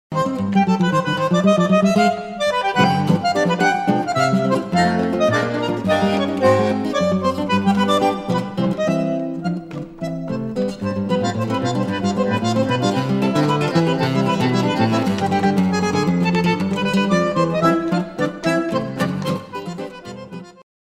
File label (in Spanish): Arpegios en negras y en, Corralera